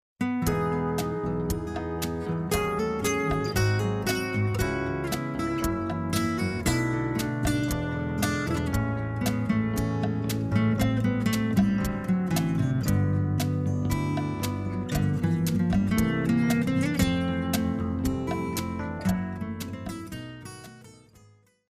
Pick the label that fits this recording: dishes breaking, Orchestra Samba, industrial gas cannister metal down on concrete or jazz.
jazz